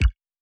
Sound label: click